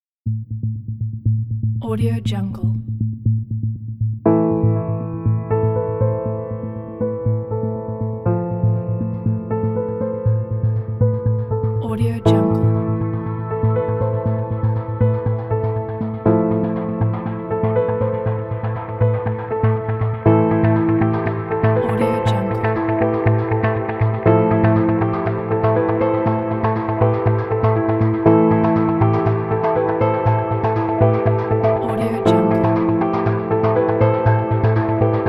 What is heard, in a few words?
dramatic tension suspense